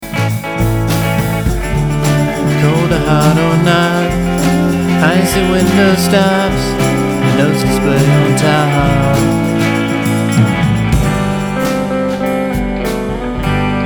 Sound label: sorted filter